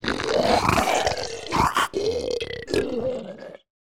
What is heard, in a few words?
Zombie Disgusting Sounds, Monster Sounds; TheDeclineOfWarriors; Monster Sounds Volume II